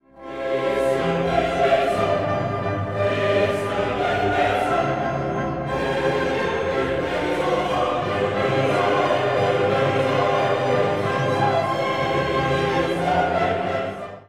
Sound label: Christe Merry Chorus